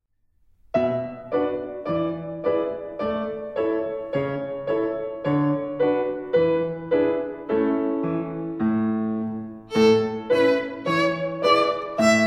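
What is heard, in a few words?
Song of the Wind in slow Practice Tempo, violin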